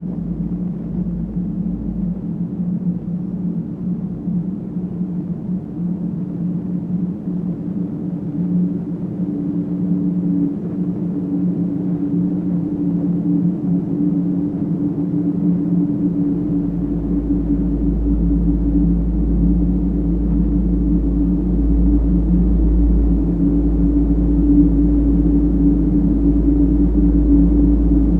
WEATHER WIND Synth, Static Pitch, Evolutive, Low Medium, Howling, Whistling, Eerie; Articulated Sounds; Rare Winds